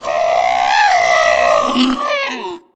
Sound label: noise howl; Haunter